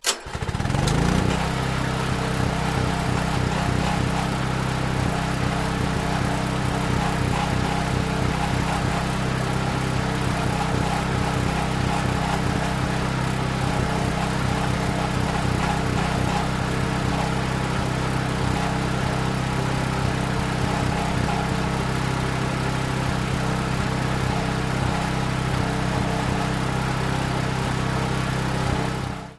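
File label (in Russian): генератор включается и работает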